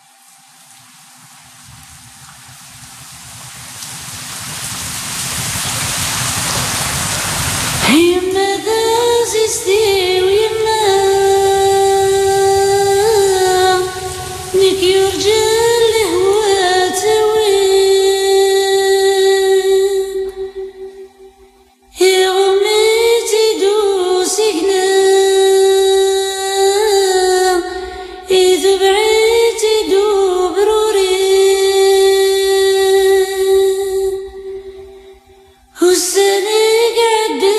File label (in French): Chant arabe